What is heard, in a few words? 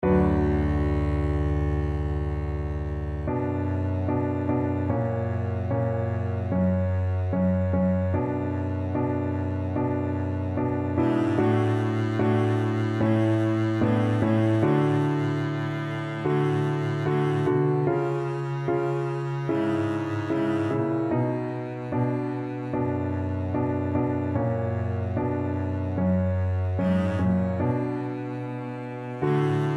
beethoven 7 2nd, Double Bass